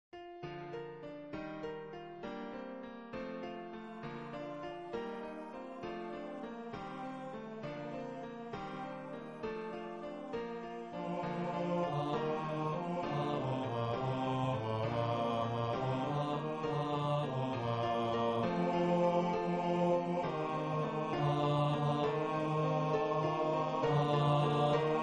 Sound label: Sussex Carol Bass